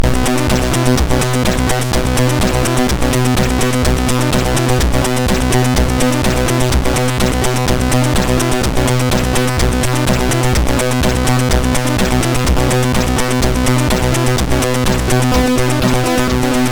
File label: sid MOS6581R2, GAMES; Catalypse